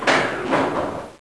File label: platform stopped